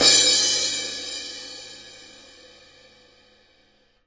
big ol 18 inch crash; Crashes

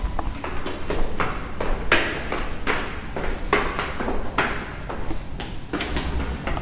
steps in the stairwell; Sound FX Real Life

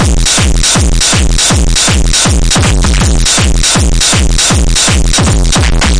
160 speaker ripping techno; 141 to 160 bpm

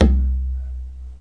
ghay, Tabla

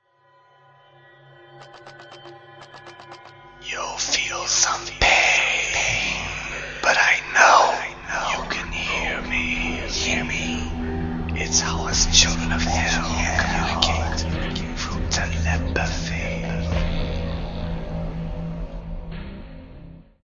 spooky children of hell intro

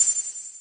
Syn Dr, Overloaded Synthetic Drums; style kits

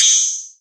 Yamaha TX-81Z OH; drum machines

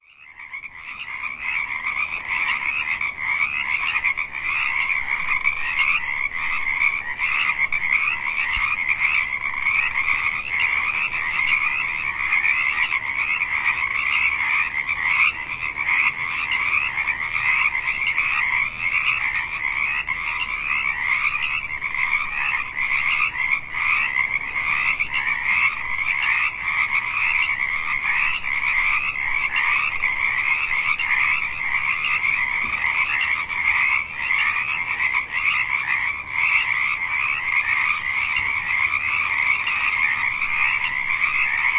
frog ambience; sound effects and noises; sound fx zoo and nature

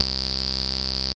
filtery synth bass

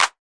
Clap; STYLE KITS (Hip Hop, Etc)

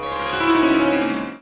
Pilu Swar; Far East Drones and Plucks

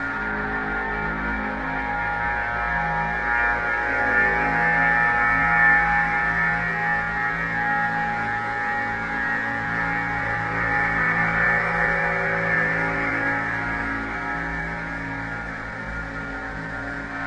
one hard pad, looping ambience

